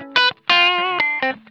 BLUE, Funk Guitar; Zero G - Funk Guitar

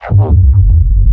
LP BASS; neuro; BIG5AUDIO

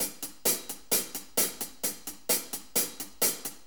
4-4 HiHat Loop

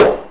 noise fx; Masterbits; Switch FX

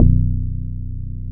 Bass Analog & Synth; MICKEY BOUSE; USB Soundscan vol.12; AKAI

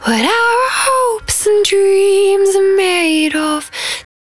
110bpm Ab, Velvet Vocal; Zero-G

